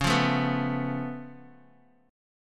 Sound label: Db Augmented 7th Db down Guitar Open D